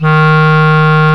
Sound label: Clarinets